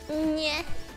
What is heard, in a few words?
nyeh